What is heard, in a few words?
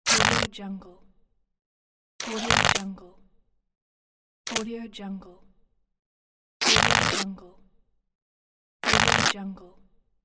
ripping fabric